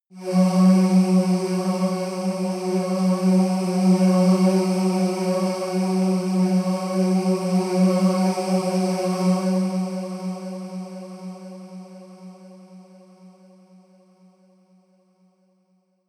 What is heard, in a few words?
airy choir; toene